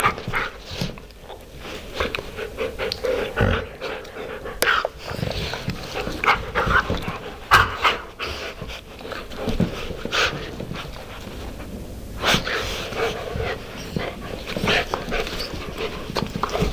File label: laugh long, canis familiaris